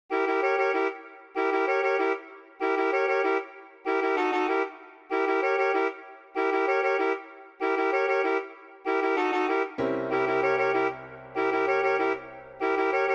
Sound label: Alto Saxophone